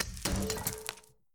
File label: ledge impact; Kismet